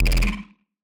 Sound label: Kick, Electronic